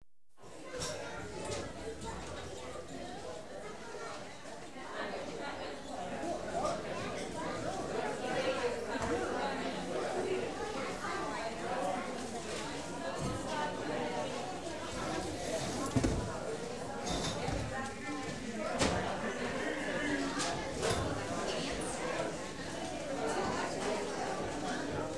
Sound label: Audience Applause; Valentino Production Sound Effects Library; Office sounds